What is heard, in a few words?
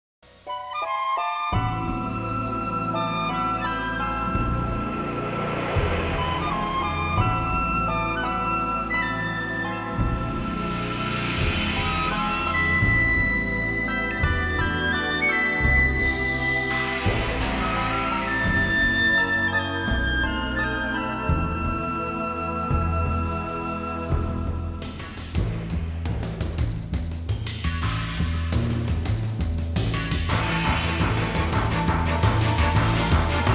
instrumental